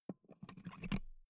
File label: insert euro plug